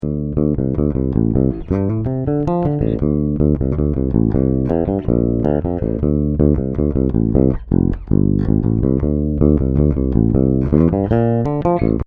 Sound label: ibanez artb100 ssb bridge, bass